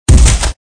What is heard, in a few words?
Rock Crush; Angry Duck Cannon 1.5